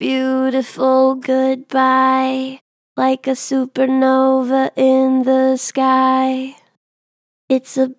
Gen Vib Beautiful goodbye, Vocals, Natural Vibrato Gentle; Harmor Vocals